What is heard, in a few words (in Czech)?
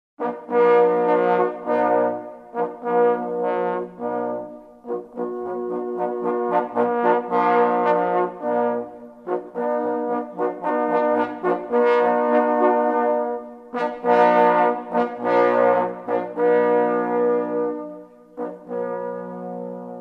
halali, troubeni